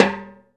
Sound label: CNTR STRK, Timbale Battery